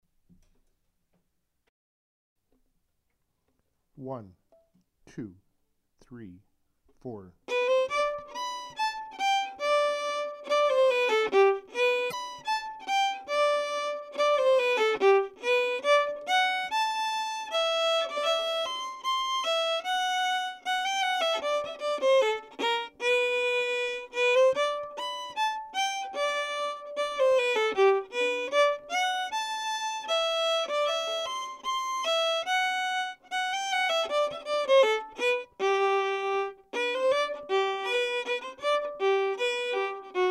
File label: The Parry Sound slow, fiddle